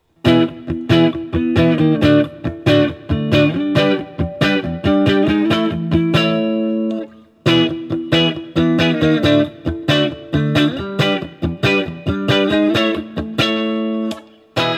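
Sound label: Guild M80 CS Tweed Chords, guitar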